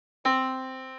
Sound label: yamaha Disk